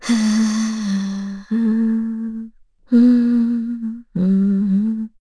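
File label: Vox Hum, Korean; heroes; FreyB; kingsraid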